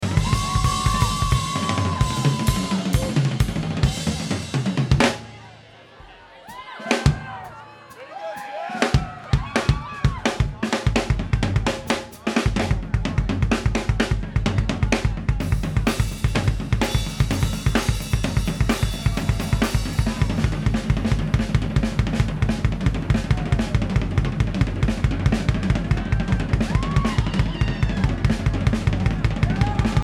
Drums

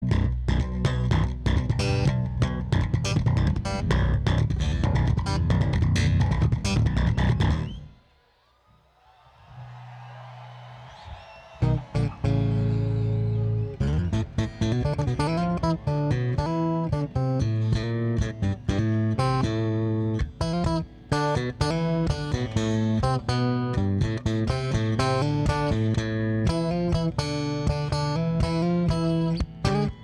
Bass Solo

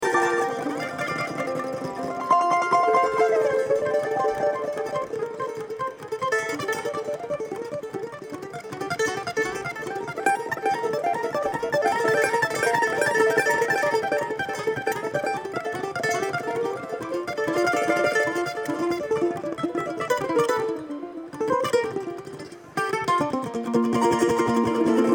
Kentucky Mandolin

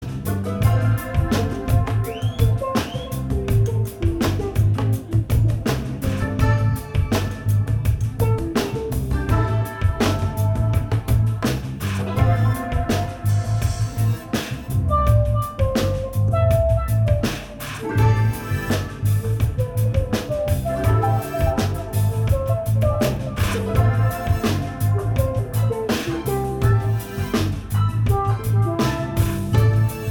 Improv Jam

